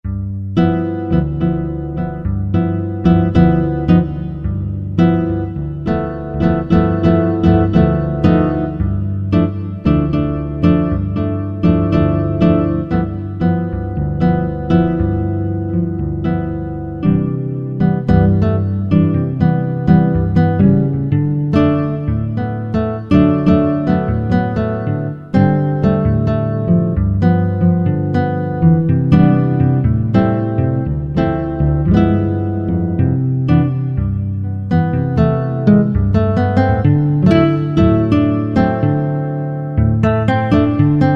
NYLON BOSSA, Roland SC-880; Factory Patches

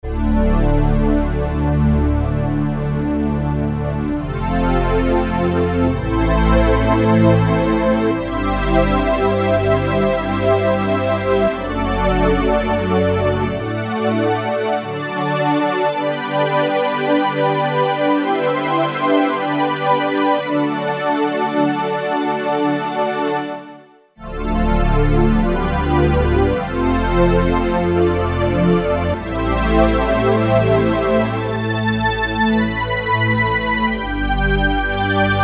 synth strings; sampler; Guess the sampler game

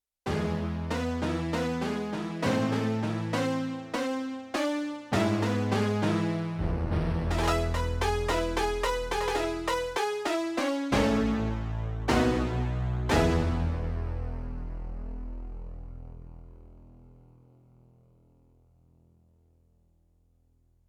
Synth Decay, Spacey Keys, Yamaha TX16W; Spacey Keys 2

